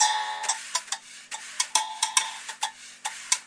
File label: 138 bpm, springy thingy and shaker, drum loops and breaks